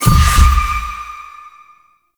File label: Minigame Ring Success; Birth Minigame; Warframe; Jade Shadows